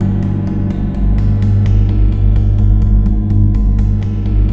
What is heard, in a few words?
TAM T; Xsample VOL-9